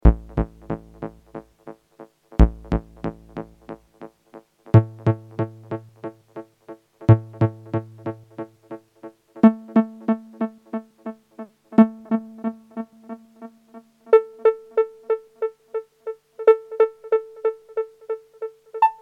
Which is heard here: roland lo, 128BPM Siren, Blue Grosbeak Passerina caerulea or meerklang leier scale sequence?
roland lo